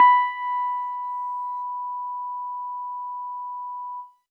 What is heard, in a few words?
EAcoustic DX100 B4